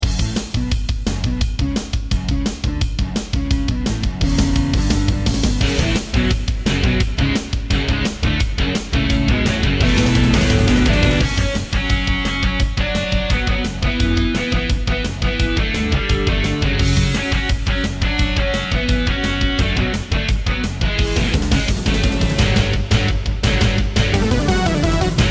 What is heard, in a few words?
Intro, Miditemp DoX-1; Duke Nukem 3D